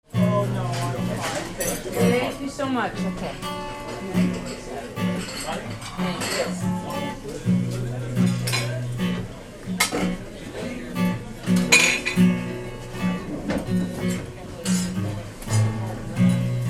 Restaurant ambience in London people talking live guitar